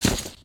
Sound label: earth, human step